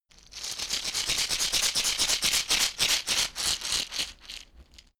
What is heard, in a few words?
shaker wood rough rattle dynamic movement